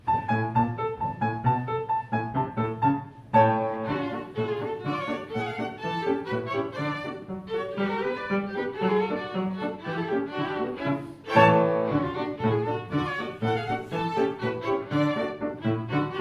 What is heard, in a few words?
Polka